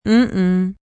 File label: Mm Mm No, female; Reaction; Exclamation; Voice Box